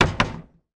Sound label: household door shed close; sound effects one